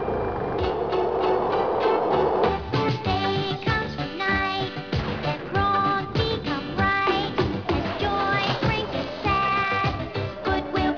duet

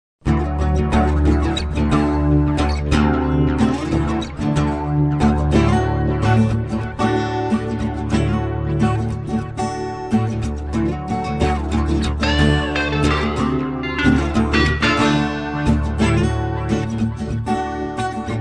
new age acoustic

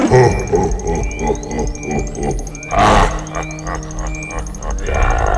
laugh; jabba

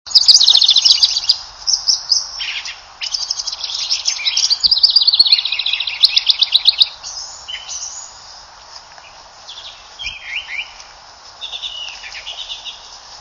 thrasher doing house wren; birdsongs

